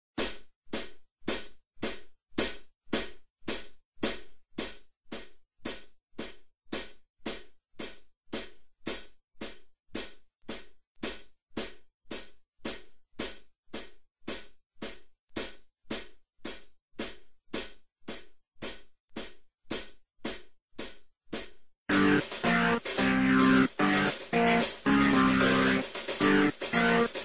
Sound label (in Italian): BASE SENZA CHITARRA